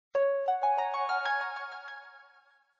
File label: Alert, Samsung SGH-i617 BlackJack II